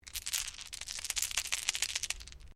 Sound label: Dice Mixed Shake Hands; Variety of dice sounds; Casinos